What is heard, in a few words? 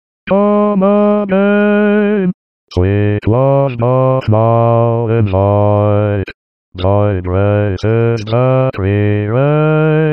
Basse; Come again